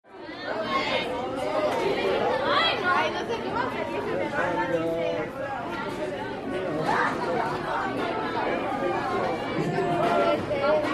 School Cafeteria Sp, spanish

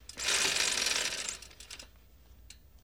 Bike Pedal Rattle X3

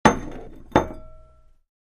Set Down Bowl On Table, glass

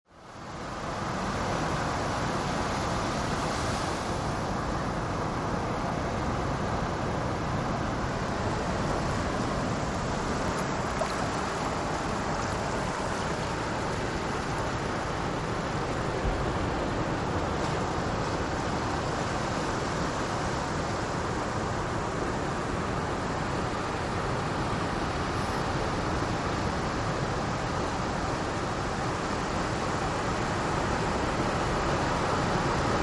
Steady Ocean Roar, waves & surf

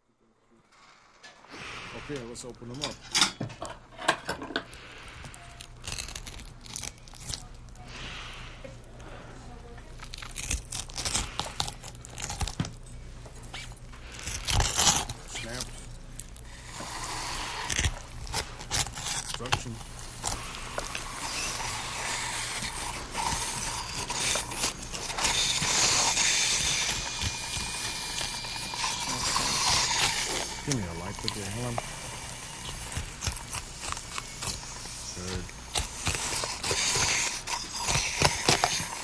Open Heart Surgery, disgusting

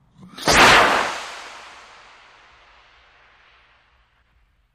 Solid Fuel Rocket: Launch And By. Stereo; small rockets

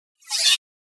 Pop Up HF Elect Whip Re, pop up element

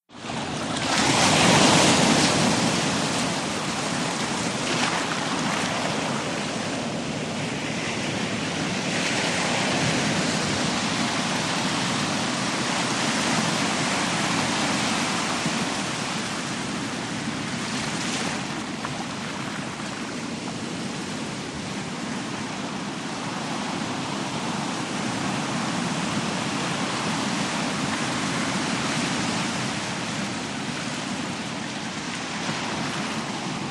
Long Rolling Waves CU, waves & surf

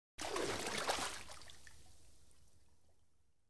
Swimming Single Arm

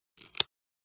Bone Break; punches kicks hits bones

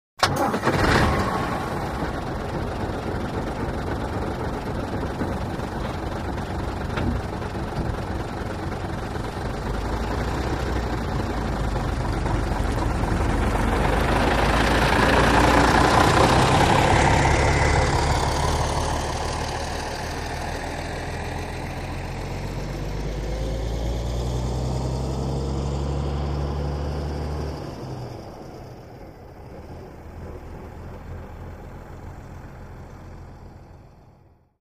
Bus Start Away Coach S

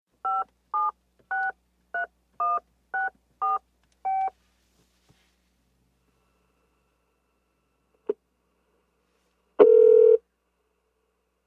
Cell Phone Inline Dial, touch tone dial; futz; touch-tone dialing & ringing; car & cell phones

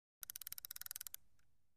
Fishing Line Out

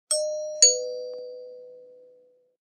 Door bell chimes, ding dong

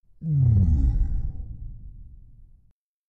Low Vocal Power Down, servos

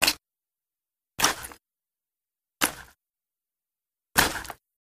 Flesh Rips, gore stabs & eat

